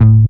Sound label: BAS TON, SOLID BAS